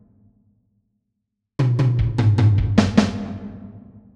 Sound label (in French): 2 temps, batteur